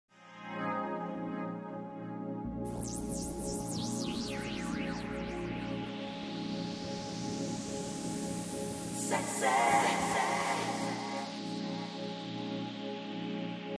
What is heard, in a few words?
Saxy Intro